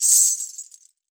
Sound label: wrist, percussion; WORLD PERCUSSION; MIXED PERCUSSION; Best Service ProSamples vol.52; World Instruments 2